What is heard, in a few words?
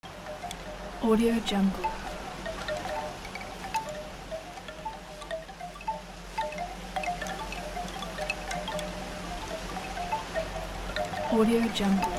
bamboo wind chimes; moonduststudio